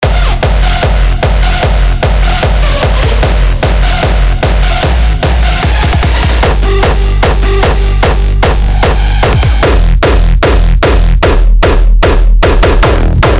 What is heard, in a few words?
Hard, Hard Kick; Revealed Hard Kick Designer Kit Vol. 1; Hard House